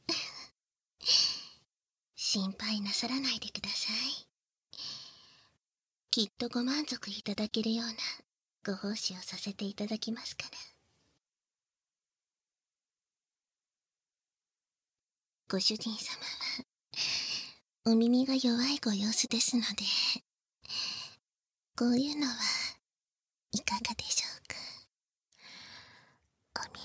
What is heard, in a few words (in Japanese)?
耳舐め手コキ（バイノーラル編集 効果音あり大きめ）, ASMR; バイノーラルマイク収録